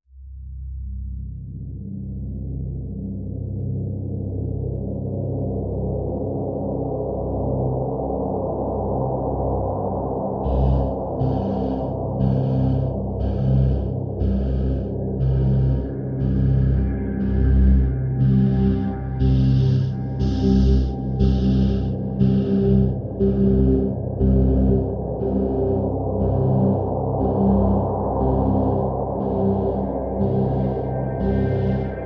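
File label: Drone Wind Farm C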